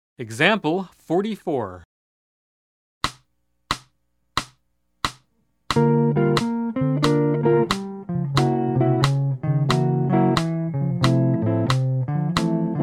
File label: Jazz